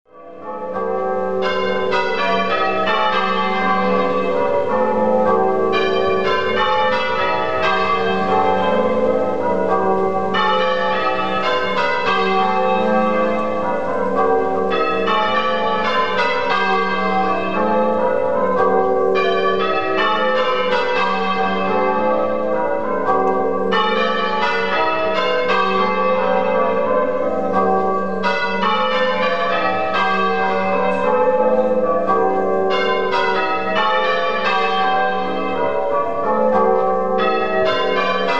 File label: Half Muffled, tower; St Erme